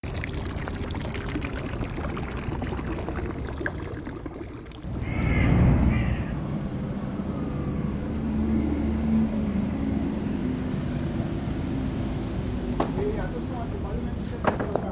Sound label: jingle